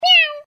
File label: Cat Meow